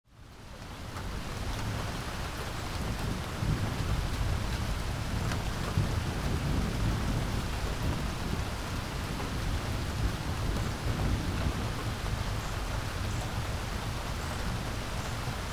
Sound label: rainstorm